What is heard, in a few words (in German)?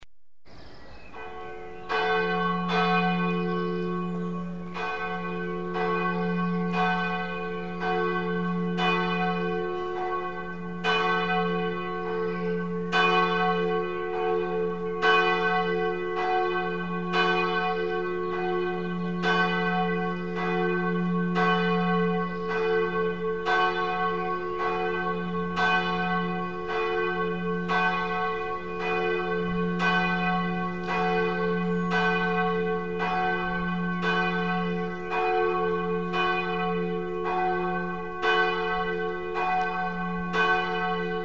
Stundenglocke; St Magni BS